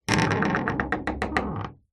household wooden door old large heavy basement creak